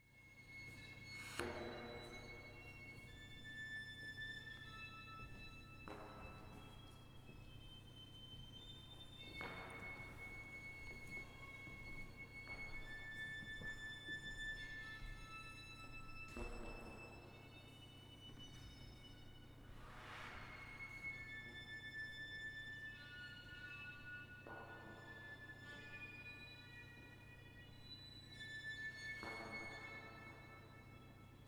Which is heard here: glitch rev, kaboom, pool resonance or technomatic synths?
pool resonance